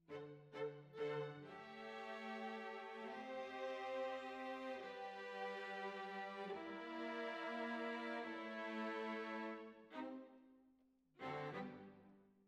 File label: Violas Over